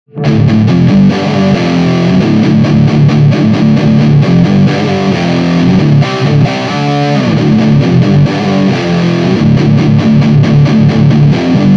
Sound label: Dark Heavy Riff; Bax Bangeetar